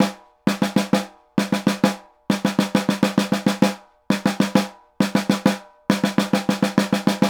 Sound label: Snare, Roland LA Composer